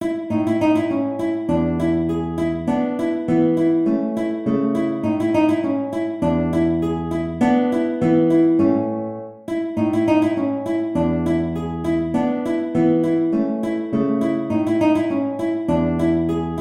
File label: Allegretto A guitar; Carcassi